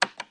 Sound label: Button Pushes Plasti